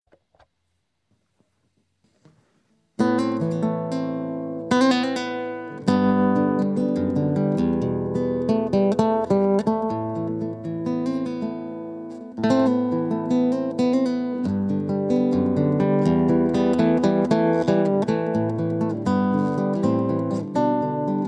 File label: Solo